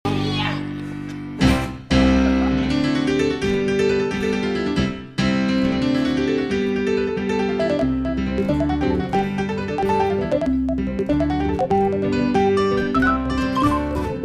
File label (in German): Steirischer